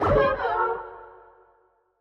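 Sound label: Damage; Smooth Skin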